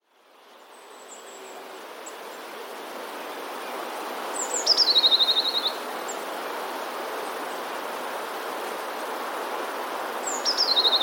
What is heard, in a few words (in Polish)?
spiew